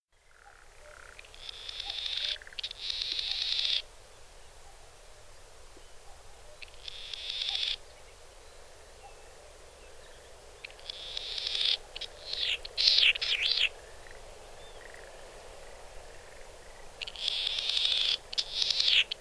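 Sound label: Latham's Snipe, nagano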